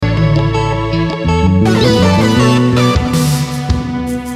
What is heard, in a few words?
Brass, Brass dans l’arrangement; section de cuivres